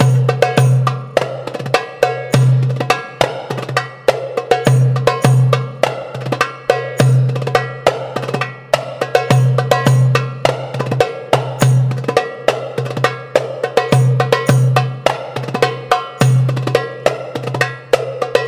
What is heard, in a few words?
chiftetelli